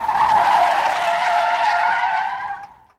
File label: police skid long; Blind Drive